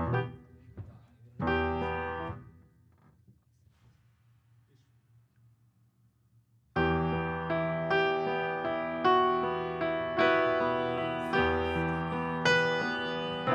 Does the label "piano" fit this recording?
Yes